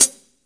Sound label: HH, Drum Dry; East Collexion; Drum 1 Dry